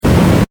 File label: Science Fiction Flame Booster Short Burst; Spacecrafts